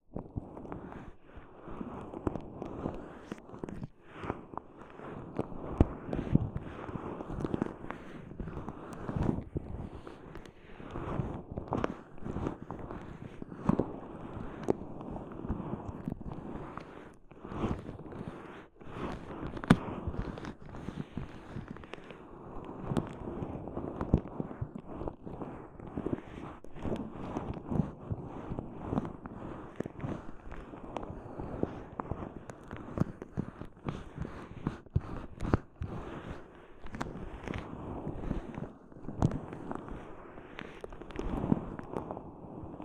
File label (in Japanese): ゴム手袋 で お耳 の マッサージ を する 音; 耳かき音玉てんこ盛り！！03～声なし就寝用音源～